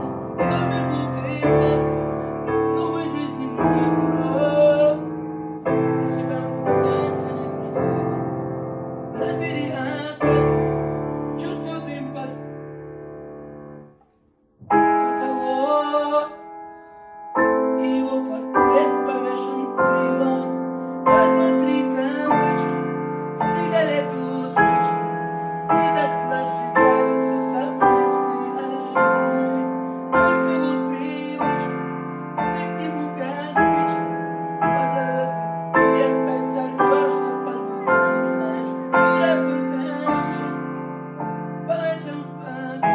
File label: acoustic